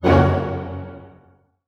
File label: F# MAJ PP, orchestra hits; Sound On Sound - Peter Siedleczek's Orchestra